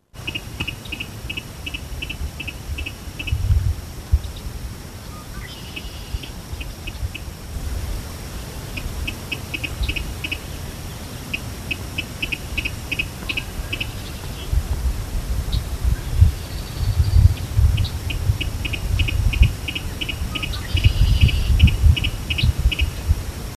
Virginia Rail ka-dik calls; Worcester MD

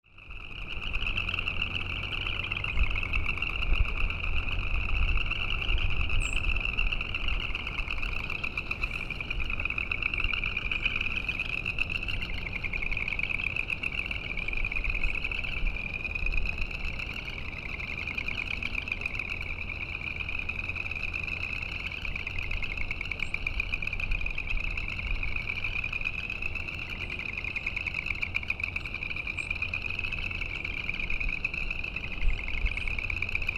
New Jersey Chorus Frogs, Worcester MD

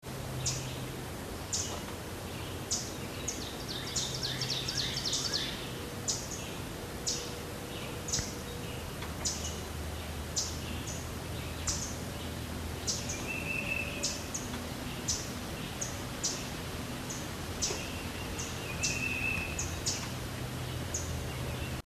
Worm-eating Warbler Chips, Dorchester Co MD

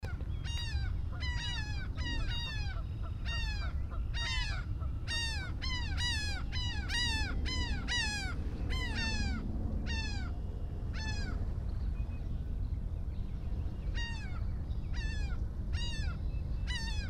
Laughing Gull

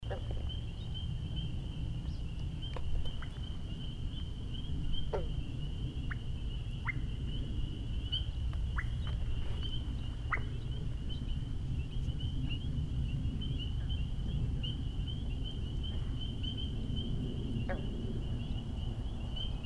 Whip-poor-will calls; Finzel MD

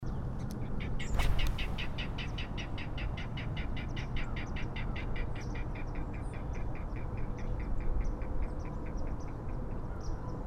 Large Rail; Fishing Creek Calvert MD

